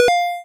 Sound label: Retro Coin